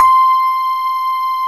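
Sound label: grand piano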